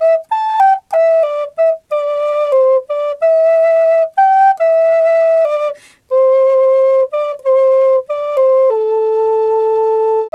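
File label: flute, 93 BPM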